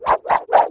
falling sound

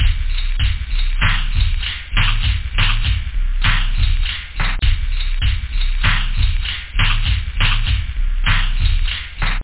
bounce; da capo vol1; instruments; techno

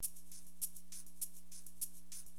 Drums, Acid Jazz Funk; LiveAct Pool

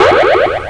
Poisoned!; Apeiron 1.0.2

